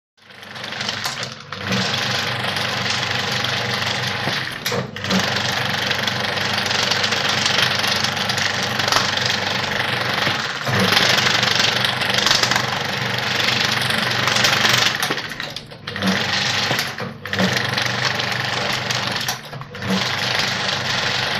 Moviola Running

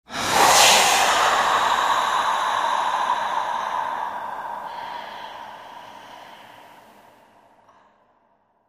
Air Hiss Big Release

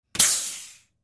Air Release Brakes

